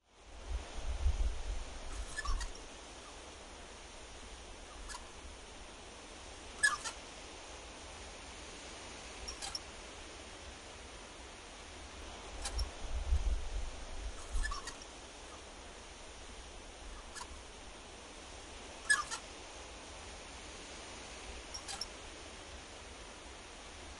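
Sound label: weather vane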